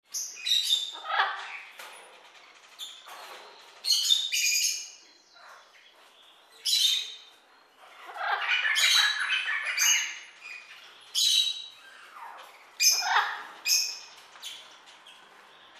Birds chirping and tweeting

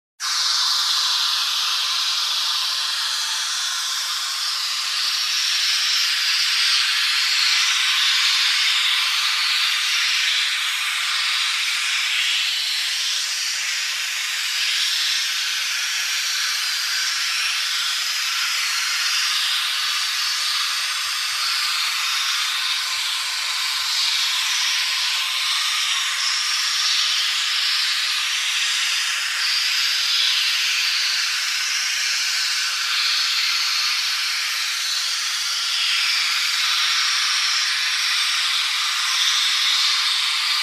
Air Pressure Regulator hissing

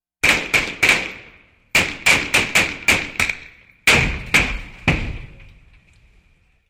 Flare Gun Shots

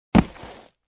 Boxes being put down

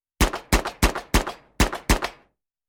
Semi-automatic Pistol Shots

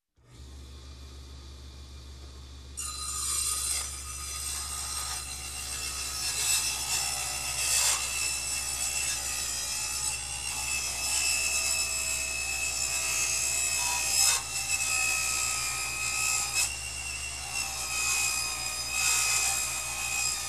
Metal cutting using electric cutter

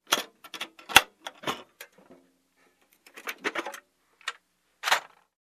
Metal Tool Box Clanks

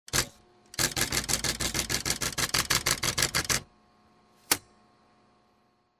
Automatic Stamper Sound

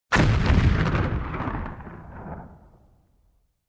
Bomb detonation

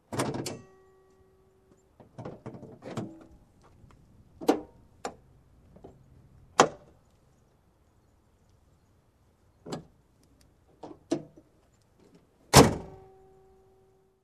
Engine Hood Open and Close

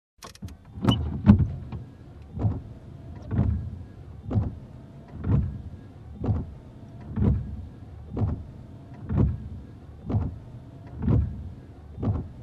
Windshield Wiper

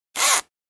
Cloth Purse Zipper close

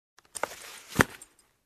Sewing Tool Box close

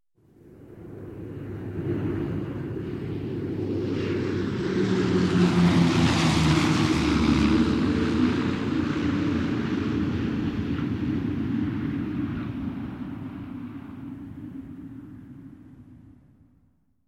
Air Jet Whirring

